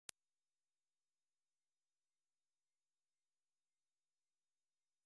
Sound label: sin 7000, sine wave frequency